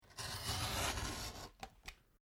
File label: Cardboard cut long; Material components for constructing more complex sounds